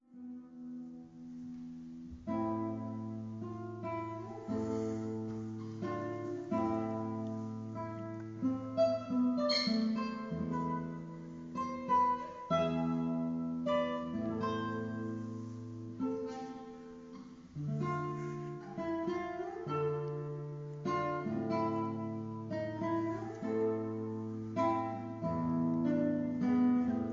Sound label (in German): Gitarrenkonzert; Recuerdos